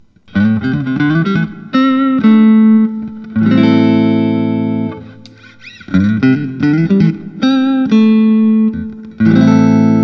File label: fender custom shop 60 stratocaster